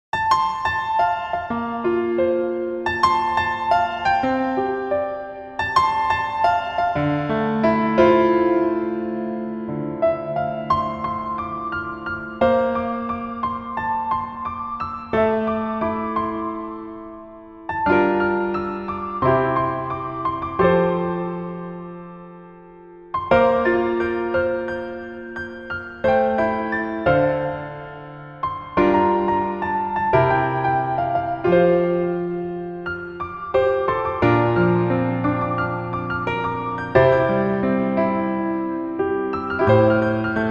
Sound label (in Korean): Piano ver 멜로디MR